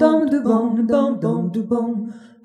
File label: Jazzy Harmony